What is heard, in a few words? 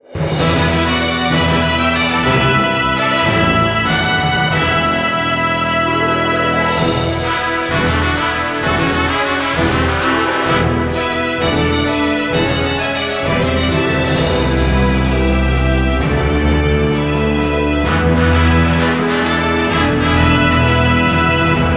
Triumphant Tournament Victory; Naruto Shippuden: Ultimate Ninja Storm 4